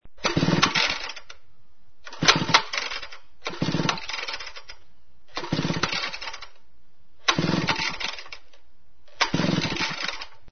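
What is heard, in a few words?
CHAINSAW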